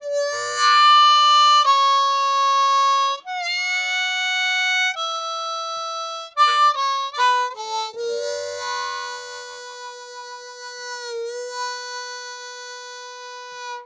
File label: Harp Excerpt; Verdigris Music